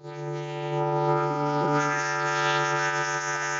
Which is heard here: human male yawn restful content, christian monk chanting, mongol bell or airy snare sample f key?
mongol bell